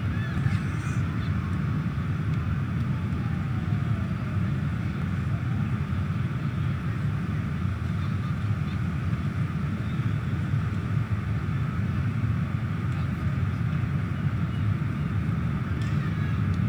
Park quiet; ambient; Project Gotham Racing

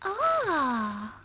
F happy

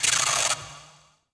Zap Spit, electrical sparks

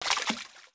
FS Water, footsteps

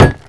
Ball Hit Post

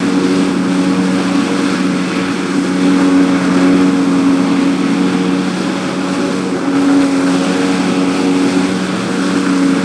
Engine; Splashdown; XBOX

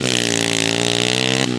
power, Engine

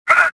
jump; Star Wars Jedi Knight II Jedi Outcast; XBOX

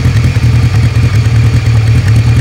idle, sled; WhiteOut

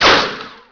ricochet; Chase Hollywood Stunt Driver; XBOX

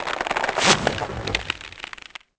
bridge break; The Elder Scrolls III Morrowind GOTY; XBOX

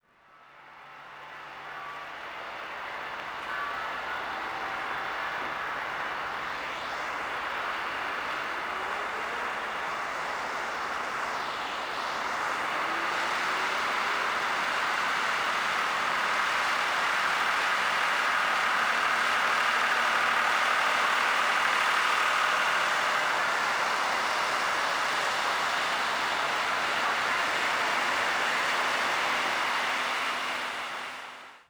Paris River, Ambience; Project Gotham Racing 2

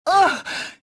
pain; mothma

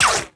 laser Bolt ric; impacts explos

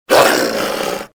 attack, dog; enemy; Soldier Of Fortune II Double Helix; XBOX

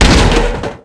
body fall; Tony Hawk's Project 8; XBOX

